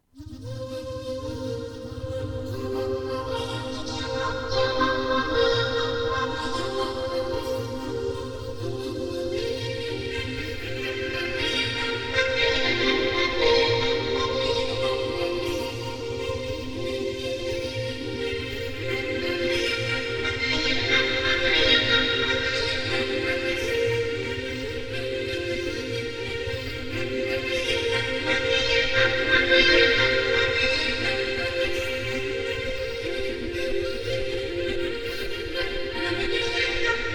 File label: VocoTouch